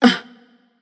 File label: surprised passion; chaac